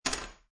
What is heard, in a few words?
Poker Chips Drop One Chip On Wood Table; Gambling Sound Effects; Lynne Music